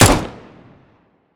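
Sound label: usp unsilenced, gun; arccw go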